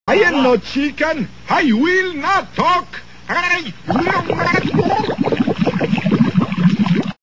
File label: drowning